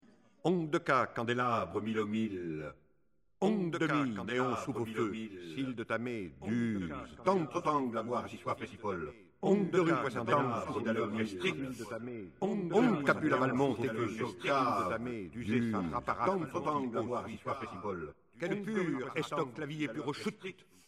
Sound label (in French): voix travaillee